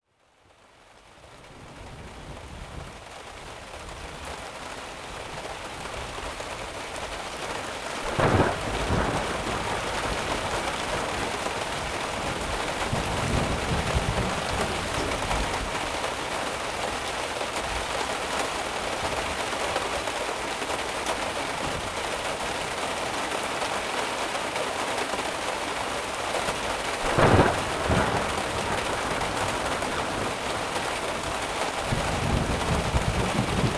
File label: Severe Thunderstorm; Relaxation - 25 min; RobinHood's SpectraStrobe Nature Sessions